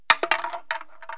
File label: Tin Can Falls on Concrete; 1,000 Sounds (16-bit); Household; Cans & Containers